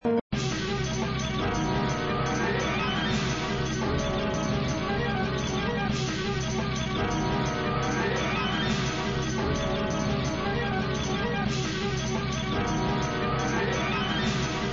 Music looping; Get the tank music (looping); flipper

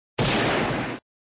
Sound Gun shot, flipper

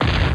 Nudge Add

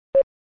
Sound SFX, flipper; sttng

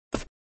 Step; flipper

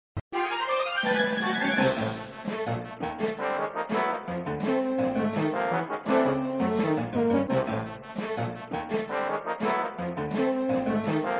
Music looping, flipper; Escape in the Mine Cart

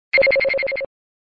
Sound SFX, flipper; sttng

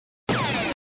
Sound Shoot, flipper

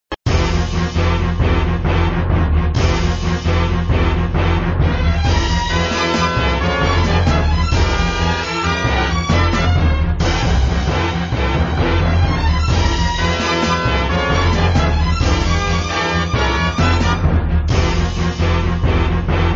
Music, flipper; sttng

